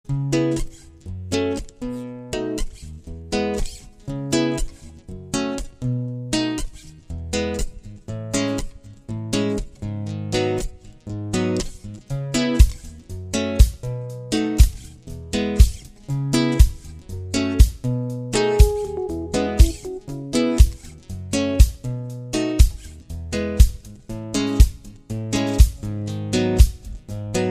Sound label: Sweet Guitar